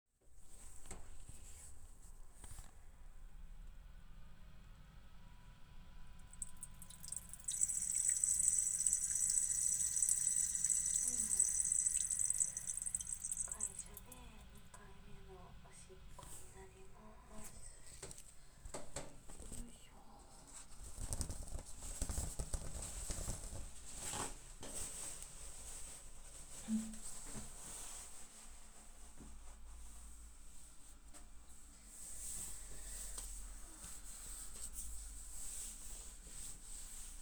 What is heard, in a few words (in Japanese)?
会社のトイレでオシッコ